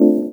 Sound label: Chords Tones; Equipped Music; Loungin House